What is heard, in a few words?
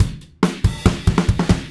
Drums Rock Fill, loops; Alex Sanfilippo